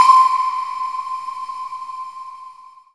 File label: Sonar, Korg Z1